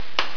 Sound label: farm